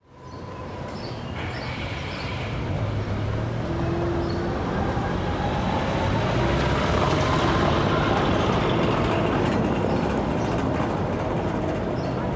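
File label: Dierkow Town ambience; Google Earth VR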